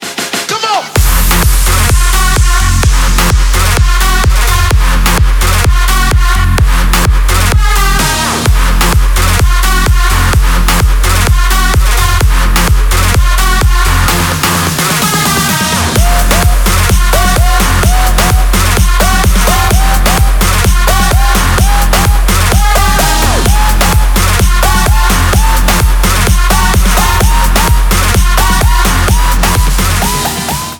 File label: tv noise solid